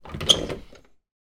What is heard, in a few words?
window open old fashioned, household